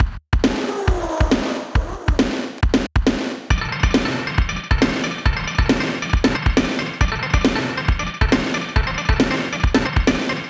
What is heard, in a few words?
Intro, 137 bpm; fashion police squad beta